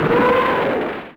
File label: katyusha; WAR